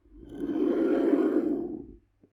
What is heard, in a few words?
home door; DanisRace